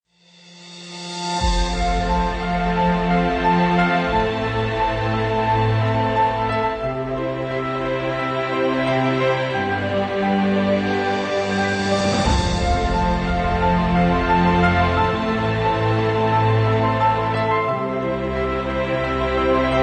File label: Inspiring Motivational Uplifting Epic, 史诗，鼓舞人心和激励人心的电影轨道